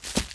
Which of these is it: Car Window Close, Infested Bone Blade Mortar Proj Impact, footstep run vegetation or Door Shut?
footstep run vegetation